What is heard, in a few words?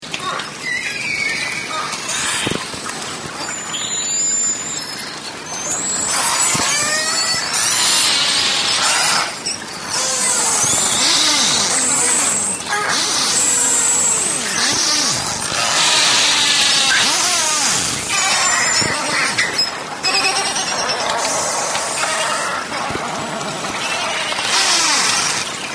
beluga